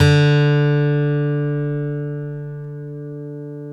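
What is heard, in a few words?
GTR D2 AC; Synclavier Sampler Library; KEYS AND GUITARS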